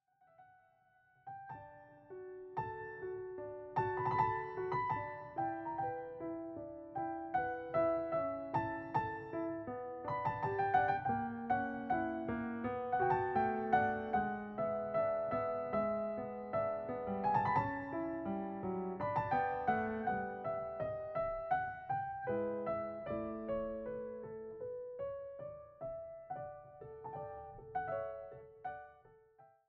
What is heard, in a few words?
Piano Sonata No. 9 in D Major, Andante con espressione; mozart; piano sonatas no 9-11